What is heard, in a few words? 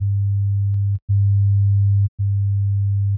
three stereo signals, 100Hz; Fundamentals of Sound